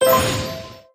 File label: Powerup Upgrade